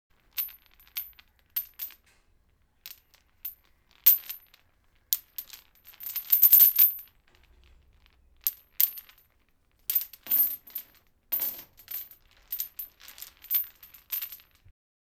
Clanking Chains

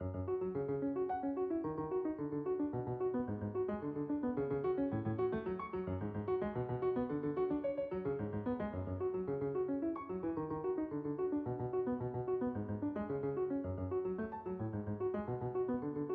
Piano Loop

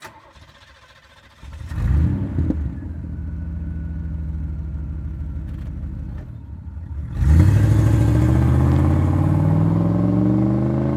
1982 Z28 Away Fast

